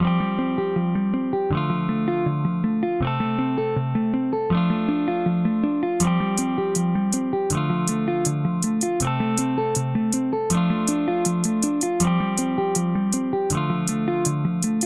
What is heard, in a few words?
menu